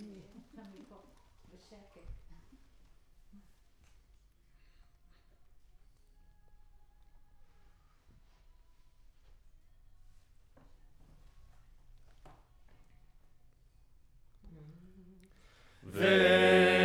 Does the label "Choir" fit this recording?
Yes